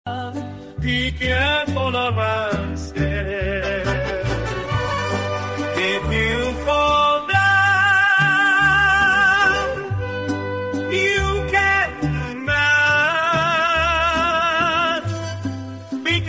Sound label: mariachi